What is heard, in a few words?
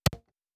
UI Click; Fantasy Interface; Fantasy Sounds Bundle